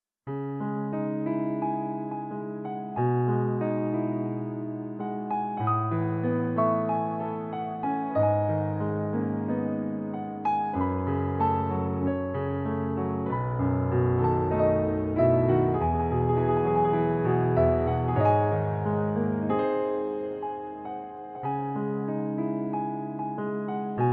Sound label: A Beautiful Piano Song